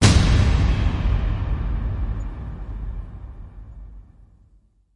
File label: Hit Big Big Shot; Brand X Music; Brand X Music Catalogue - Editor's Essentials